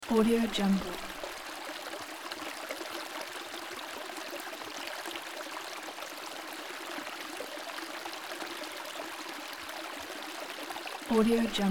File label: water stream leaking bubbles; جریان روان آب رودخانه